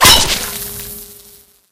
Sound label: bolt skewer, crossbow; weapons; Garry's Mod; hl2